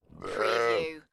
Animal Sheep Adult

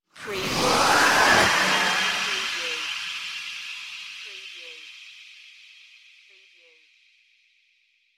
hollow space flyby

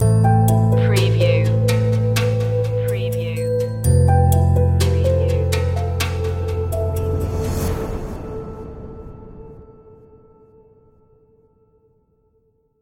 a glow stinger

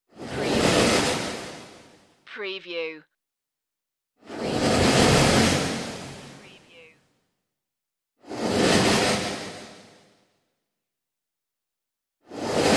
wormhole suction whooshes

